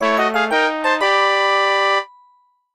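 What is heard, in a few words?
Jingle Win; Yuru Yuru Gekijou Gekijouban 2 3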